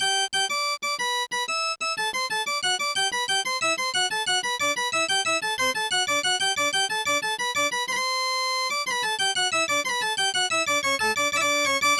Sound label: gigue fugue, ORG; Deluxe Music Construction Set